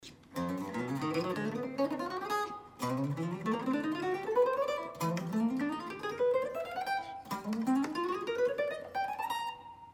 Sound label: guitar on voice mic